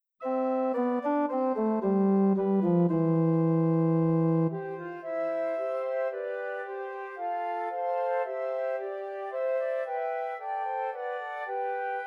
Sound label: Trios for Three Descant Recorders